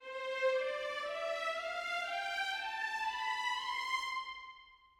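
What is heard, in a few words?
c major scale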